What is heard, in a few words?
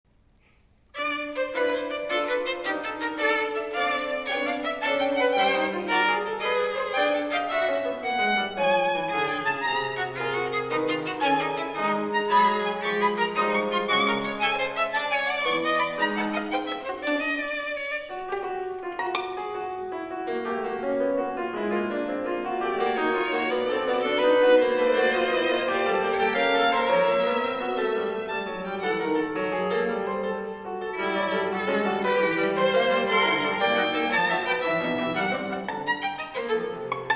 Quintet; Amici